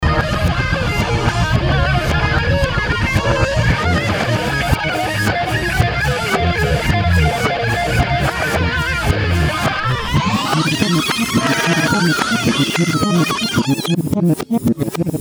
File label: reverse GTR band; Reverse Guitar band; Analog Recording Technology